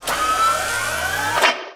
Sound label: Scifi Power Up Mech Arm; Energy & Forcefields